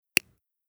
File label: scale